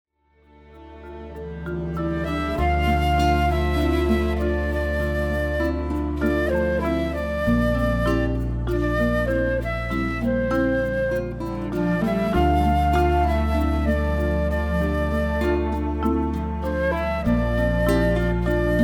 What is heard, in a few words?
Flute